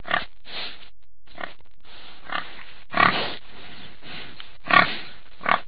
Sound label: pig, farm animals; gcompris; explore farm animals